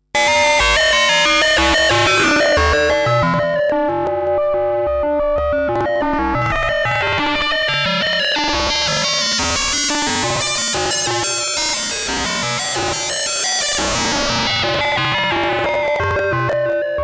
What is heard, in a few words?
FMFX Sample A FM through B; WAVeBOY Industries